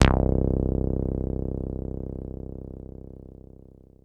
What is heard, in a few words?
303-Style single hits; FM 57 - The Ultimate Sample Collection (1997)